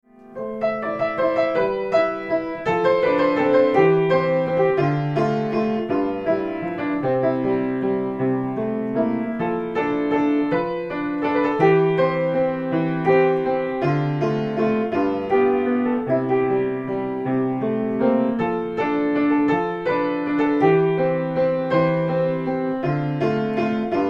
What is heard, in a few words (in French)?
valse irlandaise, piano